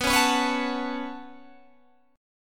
B 7th Suspended 2nd down Guitar Open G, chord

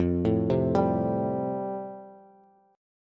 F Major Add 9th, Bass; Fadd9 arpeggiated; JGuitar

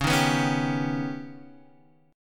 D sharp Augmented 7th C sharp, guitar

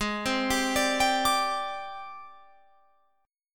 Ab Major 7th Flat 5th Ab, down, Guitar, Open F; AbM7b5 arpeggiated

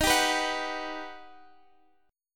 G Augmented Major 7th

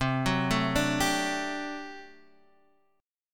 G Major 7th Suspended 2nd Suspended 4th

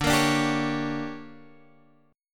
D Major 7th Suspended 4th Sharp 5th, chord

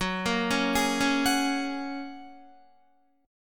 Gb Major Add 9th, down, Guitar; arpeggiated; JGuitar

